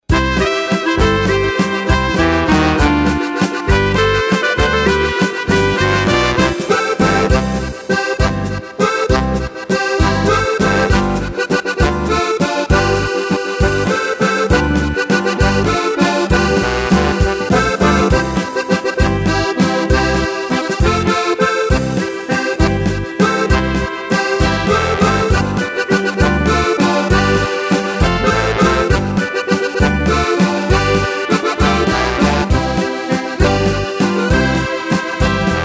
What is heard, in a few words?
Oberkrainer Waltz